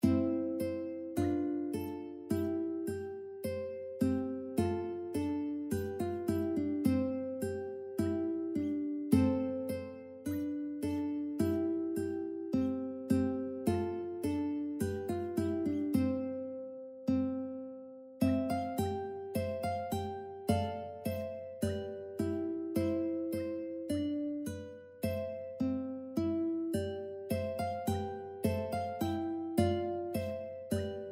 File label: uke rigadoon purcell; ukebook